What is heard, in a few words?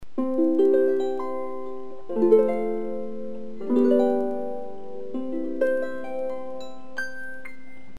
limerick nylon, harp